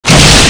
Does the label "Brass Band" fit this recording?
No